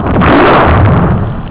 flash

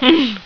mmmmm, human

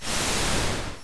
SPRAY; THEXDER

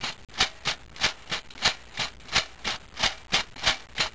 FLOUR

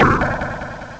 LAND; JEWELS

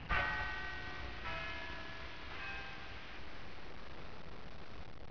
BIG BEN, CLOCKS; EFFECTS

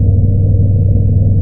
ENGINE; NIHILIST

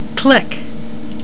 CLICK

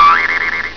BOING

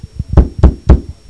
KNOCK; KRONDOR

